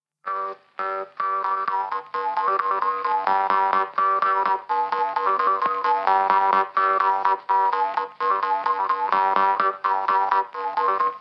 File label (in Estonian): Viru pastlavalts Kullamaa parmupill folk